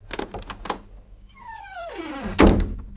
squeaky wooden french window closing and latching; glass

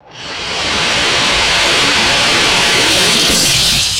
high pressure rocket thruster blast; aircraft

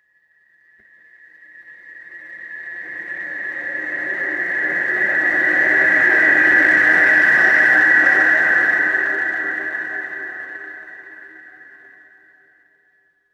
eerie synthesized tonal swell fades; swooshes

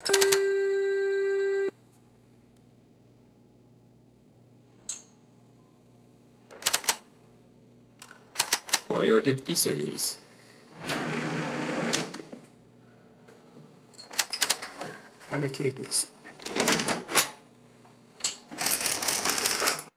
cash register drawer opening and money handling; drawers

